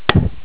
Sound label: Thump